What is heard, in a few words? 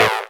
Acid Sounds A, Synths; Vengeance Samples